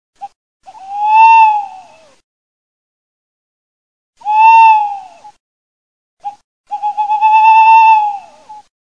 ALLOCCO

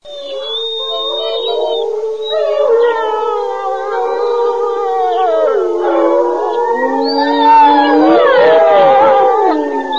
LUPO, birdsound

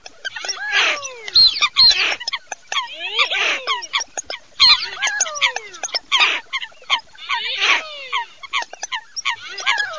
FISCHIO FOLAGA MORIGLIONI

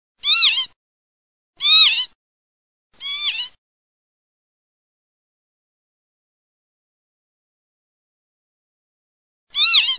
pavoncella

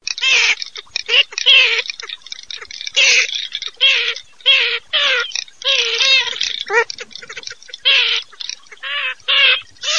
MARZAIOLA

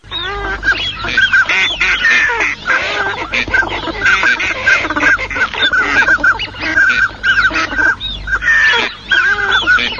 CODONE CANAPIGLIA FISCH

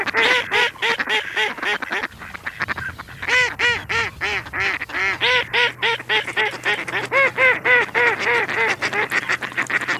GERMANO

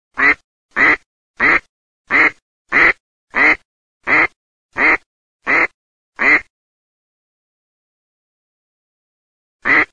GERMANO GERM

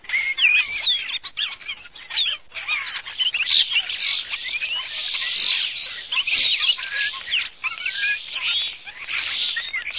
STORNO FISCHIO STORNI

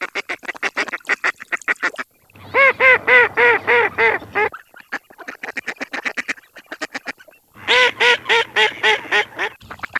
germano, birdsound

